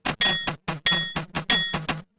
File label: oldtime pinball score